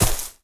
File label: grass, player steps; quake2